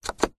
vehicles car suv nissan patrol diesel 1998 internal dash button press